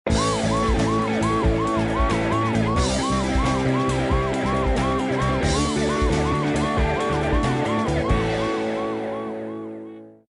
WIN MAX; Bank Raid

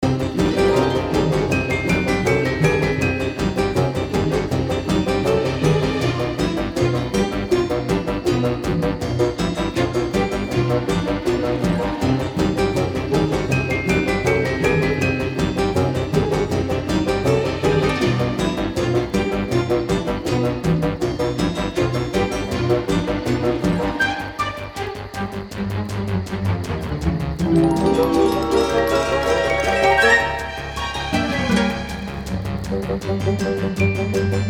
freespin melody; Beauty Fairy

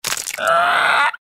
egg hatch dinosaur; trex; The King Of Dinosaurs

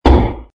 super game won; games; poker; American Poker The Legends II

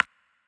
gambler mouse click; In The Forest; Wazdan

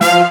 bonus chance; Roman Legion

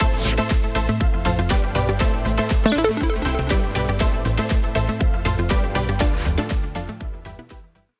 bigwin msx fx; Magic Stars 5; Wazdan

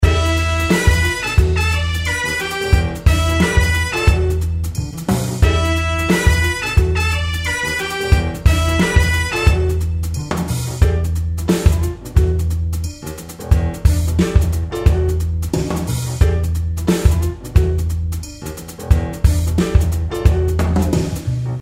speakeasy outro bgm

